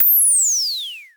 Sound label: Dual-Percussion-Synthesiser; Klone